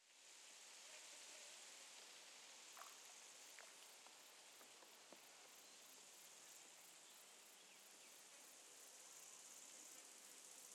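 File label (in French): Bord De Route Allemagne Sauterelles Plus Oiseaux Loin Bruit Eau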